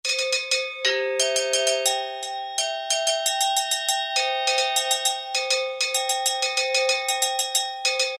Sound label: AGOGO, Roland SN-U110-02 Latin And F.X. Percussions